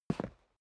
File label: step boots single concrete single stair down; Footsteps; schritte